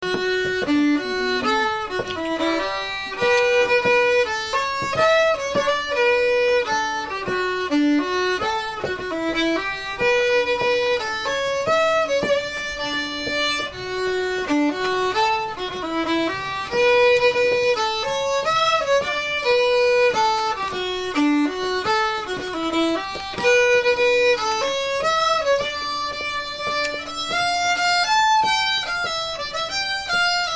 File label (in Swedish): polska i dur och moll